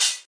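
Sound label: classic hip hop hi-hat; STYLE KITS; Classic Hip Hop Mega Kit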